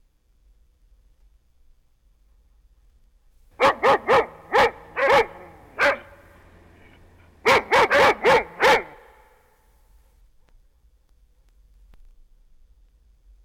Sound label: Dog Barking; Jac Holzman; Authentic Sound Effects Volume 2